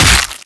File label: host abandon crack, headcrab reviver, creatures